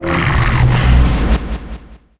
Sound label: mongoos lord attack, monster